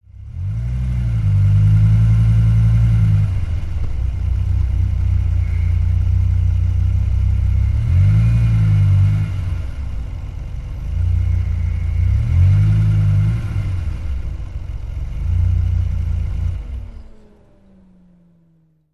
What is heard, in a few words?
Accelerate Reverse, sports car